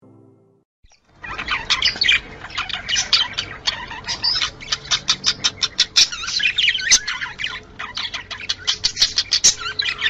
muhabbet kusu kizistirma sesi

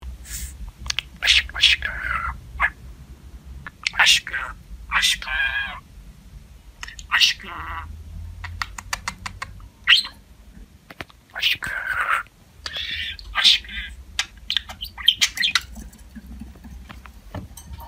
konusan muhabbet kusu sesi

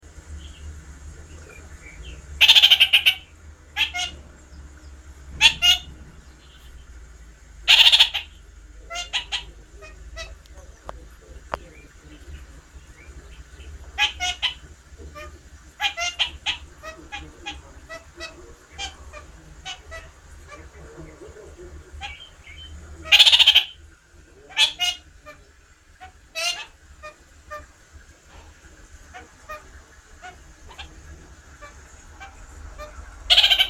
kakariki papagani sesi

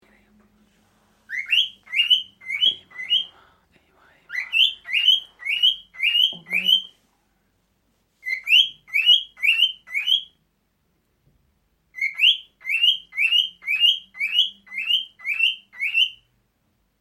erkek sultan papagani kur otusu

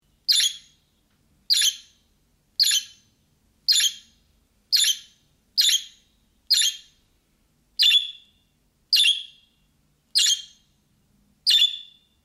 muhabbet kuşu ötüşü

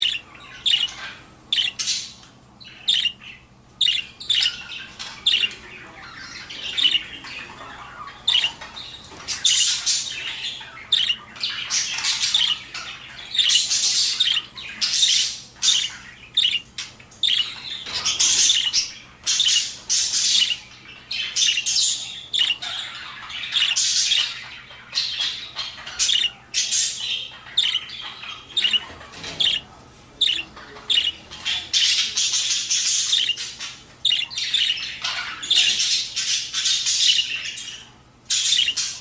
erkek muhabbet kusu sesi

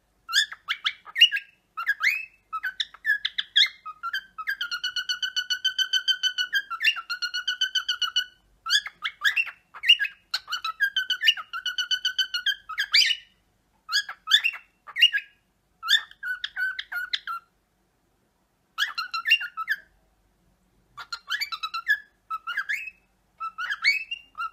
sultan papagani sesi

